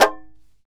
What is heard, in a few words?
tar; Roland - LA Composer vol. 3